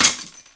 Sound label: Frozen; Risk of Rain